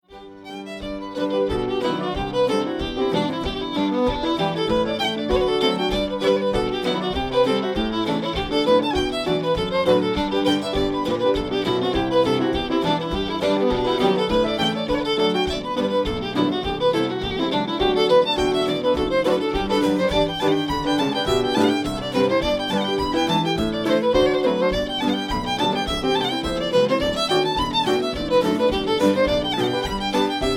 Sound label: Concertina; Gearoids Concertina